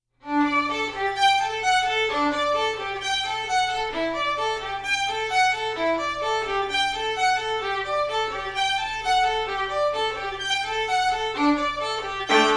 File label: quartet